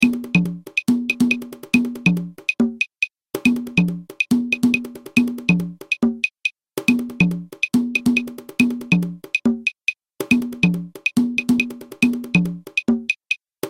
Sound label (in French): guarapachangueo cajon clave; Naissance du Guarapachangueo